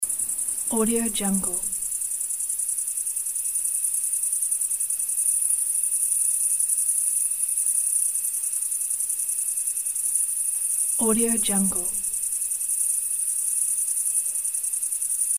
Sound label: chirping crickets